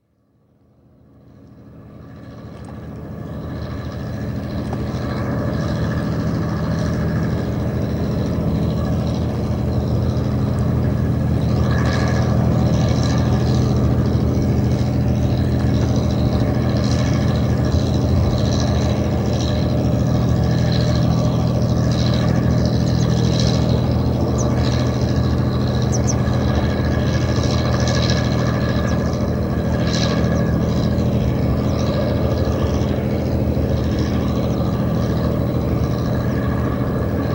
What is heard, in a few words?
Thameside atmosphere, with lapping water & distant Industry and Machines, river boat passing; river boat passing until 2m22s; BBC